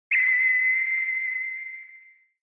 Retro Anime Accent Low Long

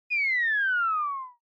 Motion Falling Whistle Short

